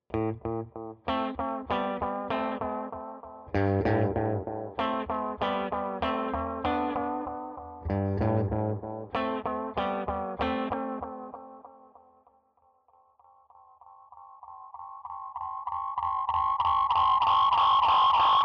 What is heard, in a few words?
Delay; Nocturnal